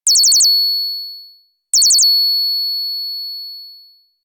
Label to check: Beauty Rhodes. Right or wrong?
Wrong